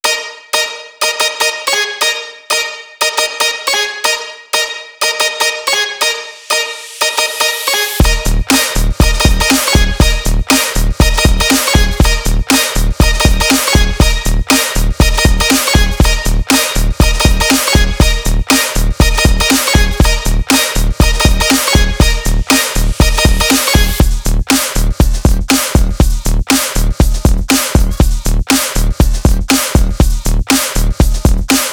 Mecha Funk

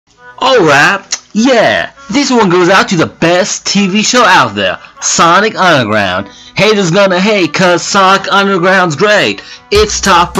Freestyle Rap

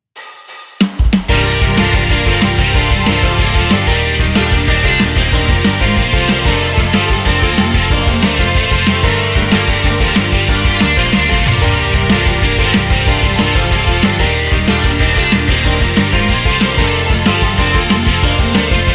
Royalty FREE Japanese Anime Game Song Rainy day

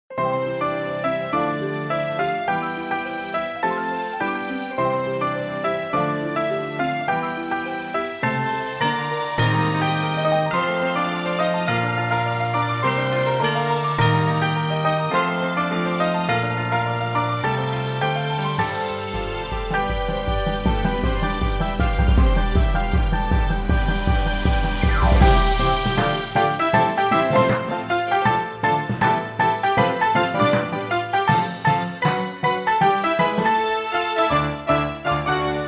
So Many Wonders (Piano)

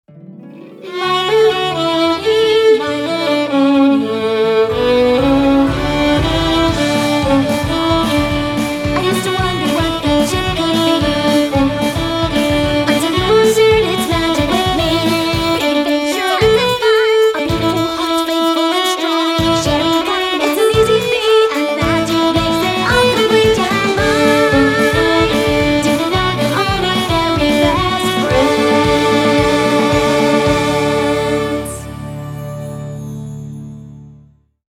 (Violin Cover)

